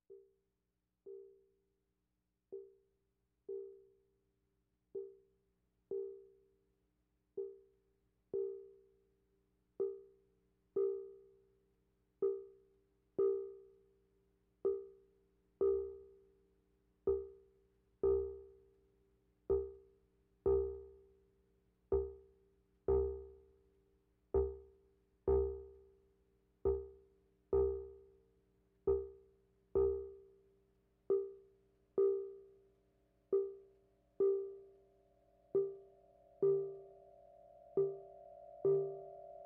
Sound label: Streams and Impulses